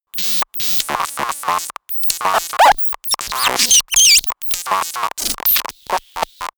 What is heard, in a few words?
glitch communication